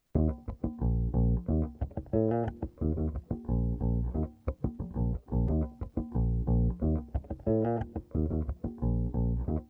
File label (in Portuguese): Baixo Vaneira Loops